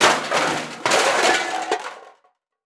Crash Metal Objects Dropping On Cement; Crashes

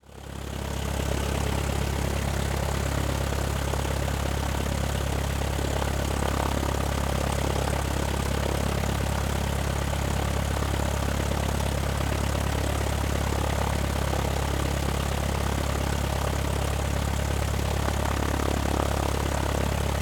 Vehicle Airplane Prop Plane Idle; transportation

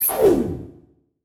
Title Transition Laser Short; Adobe; Production Element

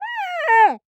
Animal Mammal Carnivore Spotted Hyena Cry; animals; AdobeCC

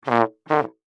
Cartoon Trombone Double

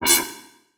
Flash Blurp; Multimedia Internet CD-Rom Flash

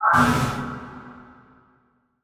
Transition Whoosh Alarm; Production Element

